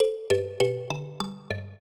trio, mbira; Mozart

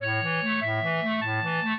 minuet, clarinet; Mozart

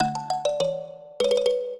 minuet, mbira; Mozart

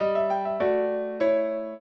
piano; Mozart

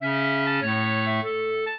clarinet; Mozart

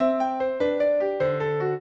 trio, piano; Mozart

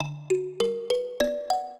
minuet, mbira; Mozart

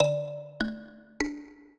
minuet, mbira; Mozart

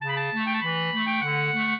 clarinet; Mozart

